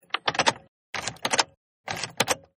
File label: Car Key Ignition Twist-3 Takes; Cars; Vehicles